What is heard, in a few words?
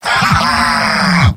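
laugh short, male; demoman; tf2; garrysmod; mvm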